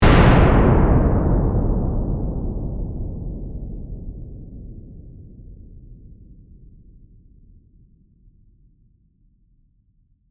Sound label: Explosion Dynamite, Warfare, Explosion, Dynamite; Bomb Sound Effects; Explosions; Blast Wave